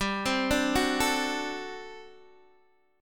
G Major 7th Suspended 2nd Suspended 4th G down Guitar DADGAD